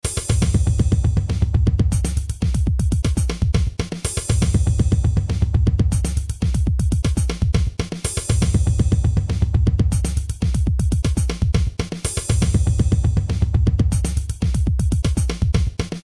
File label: mixed drums